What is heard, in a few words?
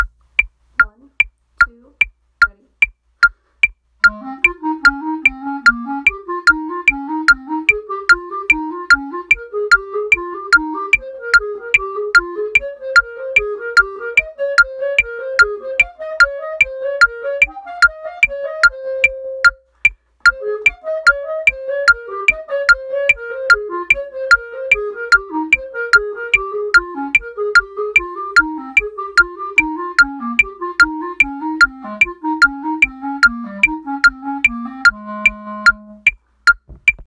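baritone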